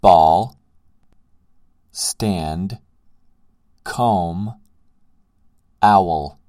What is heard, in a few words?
owl